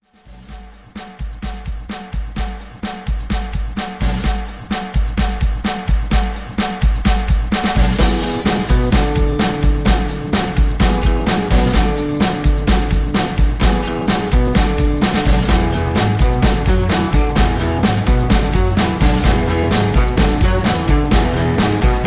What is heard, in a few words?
60s Pop Re Drum 128